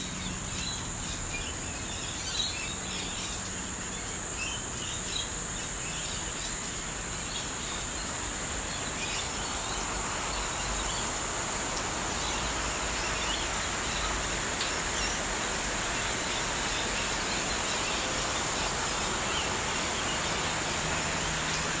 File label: rain